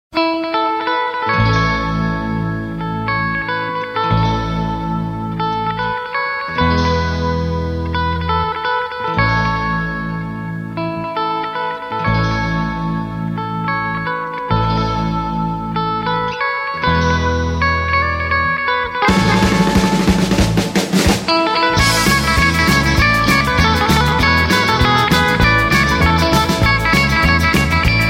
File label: Happy Guitar; Guitar Mania Vol.03